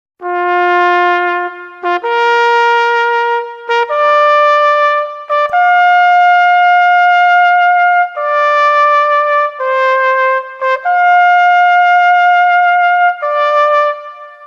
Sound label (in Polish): Hejnal Miasta Wroclavia